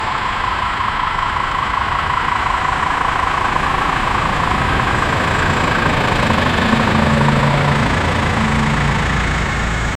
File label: sound of quite road in, field